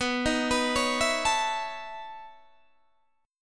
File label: B 11th B Guitar DADGAD, chord